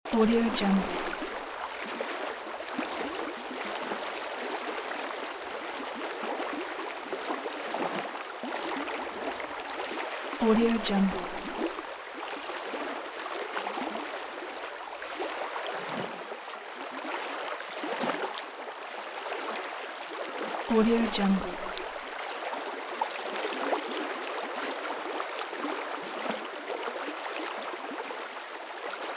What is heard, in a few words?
river flow loop